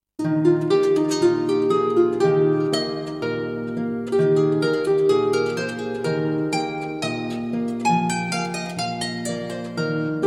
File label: bourree